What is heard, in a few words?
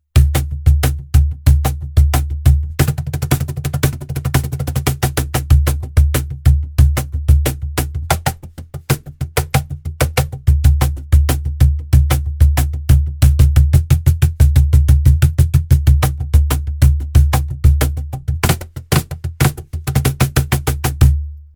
Cajon Sound